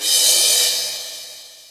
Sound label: Soft Roll, Cymbal, Yamaha DTXpress